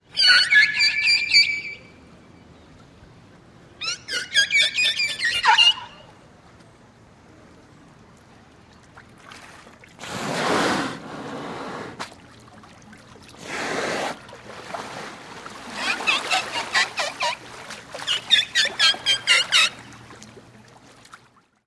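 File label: howler monkey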